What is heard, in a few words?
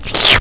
slurp; Battle of the Superstars